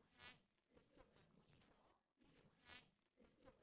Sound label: instrumental